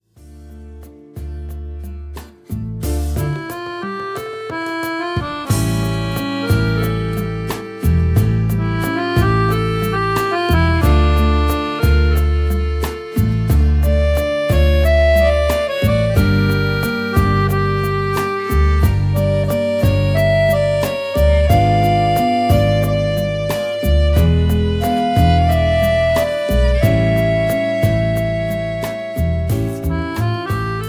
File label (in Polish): akordeon